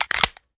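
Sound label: pistol reload empty mag in; gmod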